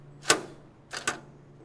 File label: Laundry Coin Acceptor